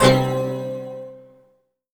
UNPLUG HIT; FILM TOOLS; Q Up Arts; Dream Experience

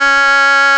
REED C#; Big Fish Audio; Synclav FM

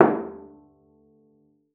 CELLO FINGER; 90 s Sample CDs; Xsample VOL-5

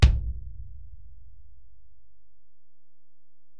Ac BD, Alesis DM-PRO; 300 Drum Machines

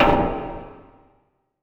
synth drum hi; Sonic Foundry (Sony Creative Software); Ugly Remnants VOL-2; Beatstuff

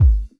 Sub, drum

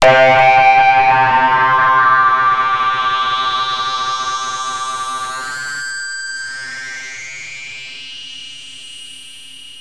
Access Virus B; Synthworx

